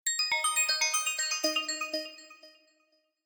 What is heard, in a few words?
ringer